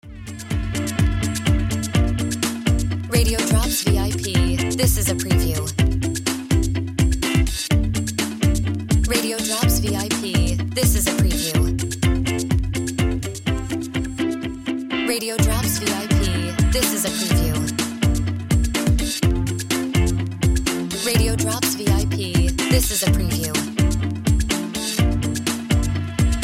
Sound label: Music Bed